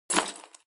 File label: equip